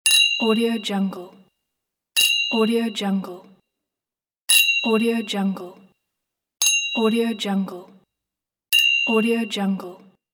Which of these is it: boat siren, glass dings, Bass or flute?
glass dings